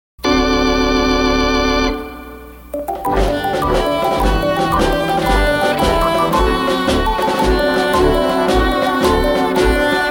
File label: instrumental